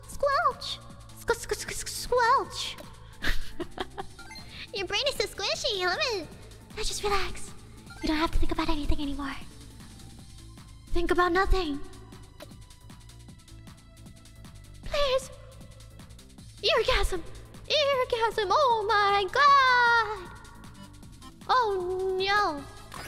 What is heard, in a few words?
squelch